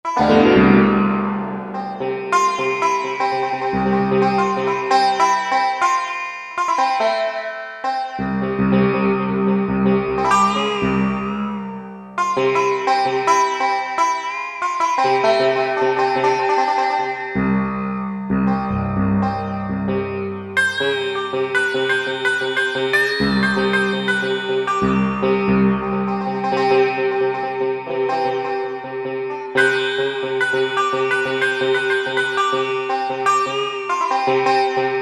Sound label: PL Sitar, Yamaha TG77; Factory Presets